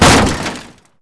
hit vehicle; NASCAR Thunder 2004

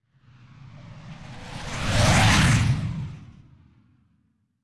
Jets, Ambient; NASCAR Thunder 2004

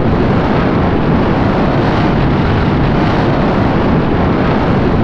windrush, Vehicle; NASCAR Thunder 2004

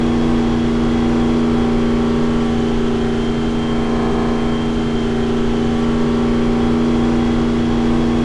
INT low, Vehicle; NASCAR Thunder 2004

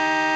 EXT horn, Vehicle; NASCAR Thunder 2004

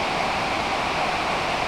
road wet EXT, Vehicle; NASCAR Thunder 2004

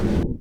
INT shift up, Vehicle; NASCAR Thunder 2004

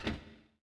clunk; NASCAR Thunder 2004

